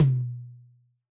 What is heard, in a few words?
Yamaha DJX 2B, Mid Tom L